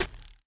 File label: arrow hit; propack